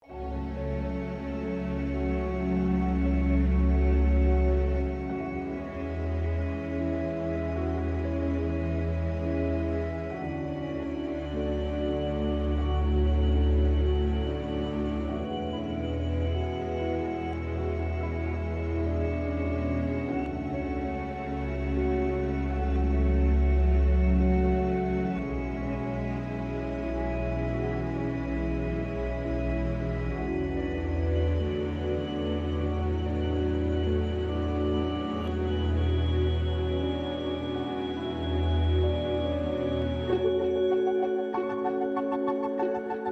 Epic, Inspiring

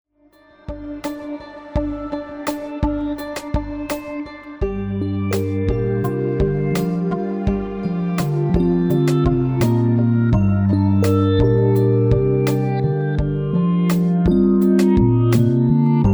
Relaxed

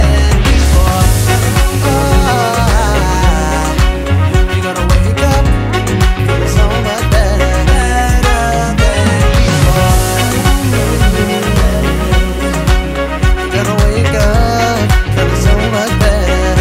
Tenor Sax

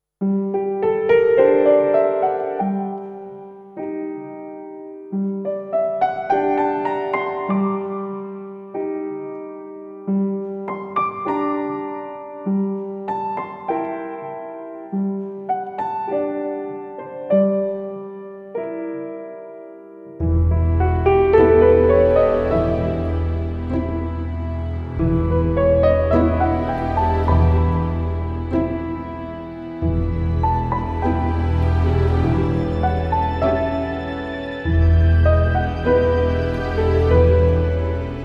Piano Theme

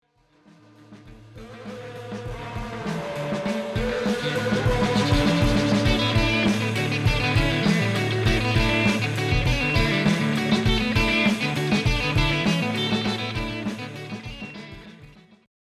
rock

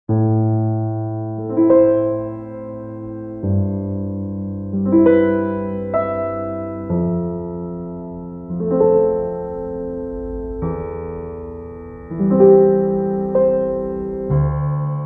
Contemporary Piano Minimalism

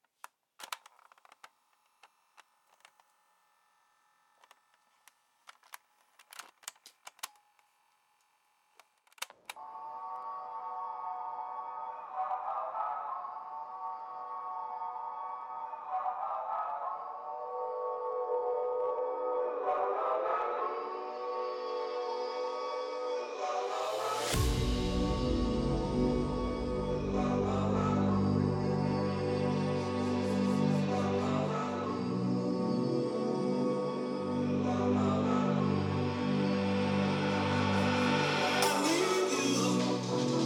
Motown Acapella Based Idea, Future Garage

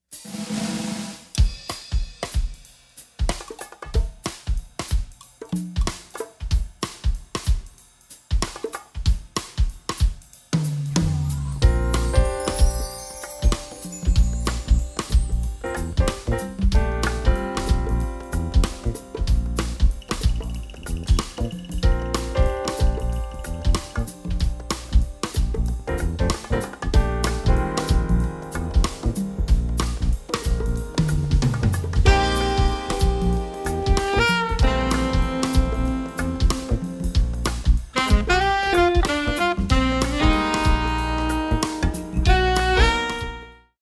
Major Over Minor

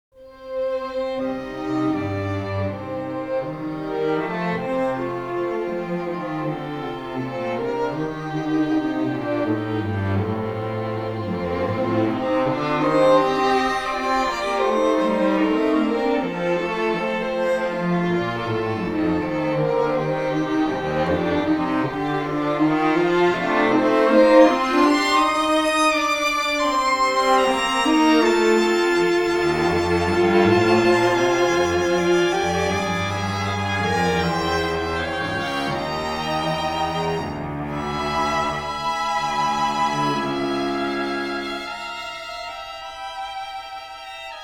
String Quartet; Skyrim From Past to Present

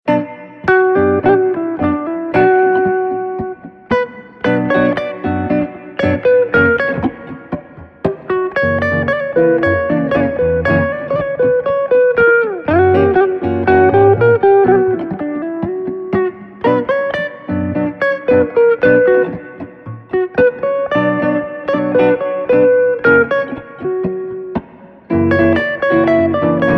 Tango in B Minor

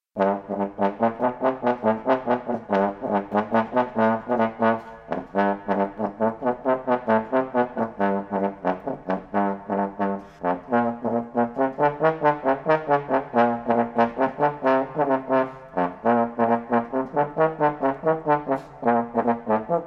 Bass Trombone

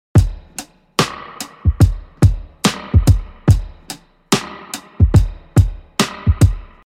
72 SPRING DRUM LOOP BALLAD CLICKER

